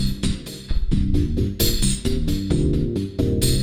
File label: Funky 125-132 Cm7